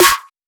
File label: Opener Snare; Fuji; M8